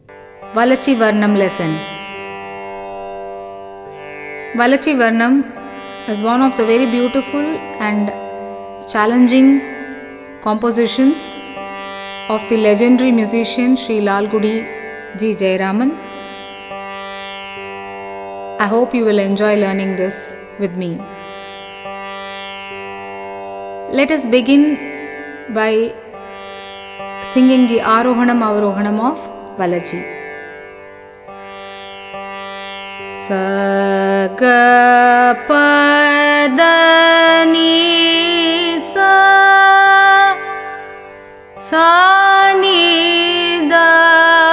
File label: varnam chalamu seya, valaji, adi, carnatic music; sample lesson